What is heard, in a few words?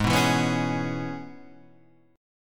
Db Minor 7th Ab, chord